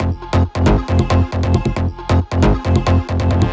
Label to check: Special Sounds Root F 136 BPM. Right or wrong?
Right